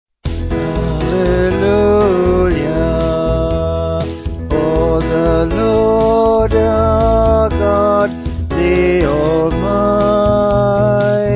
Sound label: Vocals Band